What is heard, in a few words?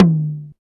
verby tome drum sample d sharp key, Royality free tom drum tuned to the D# note.